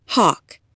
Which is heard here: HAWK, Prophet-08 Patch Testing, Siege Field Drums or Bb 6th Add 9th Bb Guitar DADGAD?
HAWK